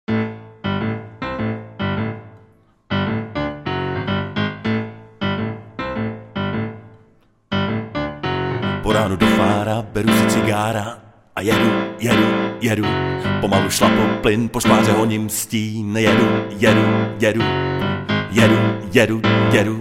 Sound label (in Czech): klavir